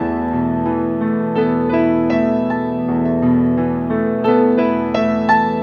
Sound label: Piano; Sonic Foundry (Sony Creative Software); Ugly Remnants VOL-2